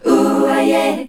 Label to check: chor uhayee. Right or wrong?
Right